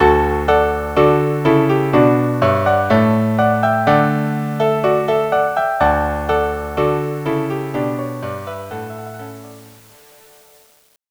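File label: March string quartet; jacquet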